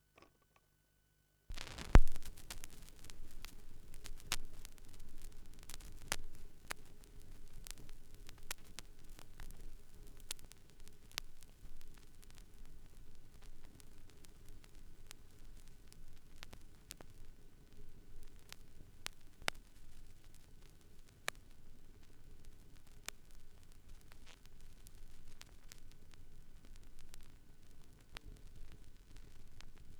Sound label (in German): schallplattengeraeusche, Just Me And My Guitar